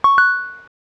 future connect, computer sounds

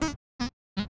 classic recycle, computer sounds

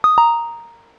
future disconnect, computer sounds

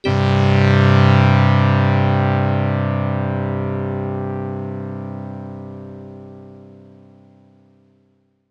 future startup, computer sounds

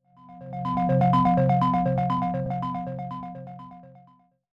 classic exclamation, computer sounds

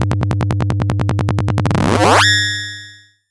sword, ringtones; ringtones (MP3)

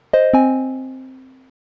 future recycle, computer sounds

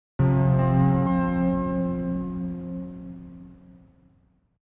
classic startup, computer sounds

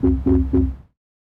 classic error, computer sounds

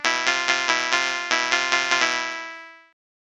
rocket, ringtones

